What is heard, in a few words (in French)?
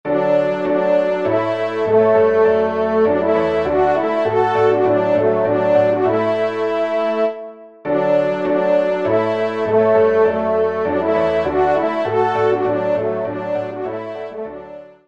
Trp et Pia; Leve Dieu; Messe d'Estival